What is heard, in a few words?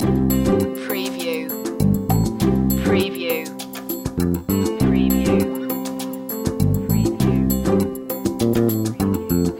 beat vouyer loop